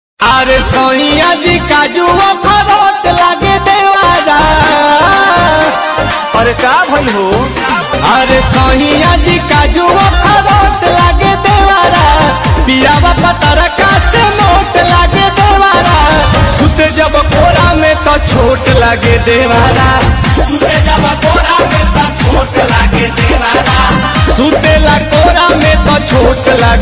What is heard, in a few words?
Holi Song